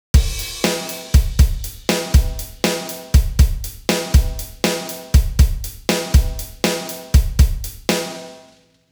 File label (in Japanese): スリップビート, drum; drum-yougo